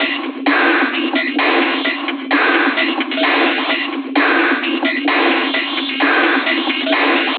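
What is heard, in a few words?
Loop, beats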